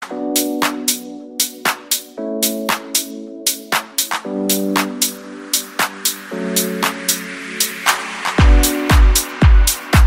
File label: best iphone8 ringtone